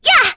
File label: Vox Attack; Hanus; kingsraid; voices heroes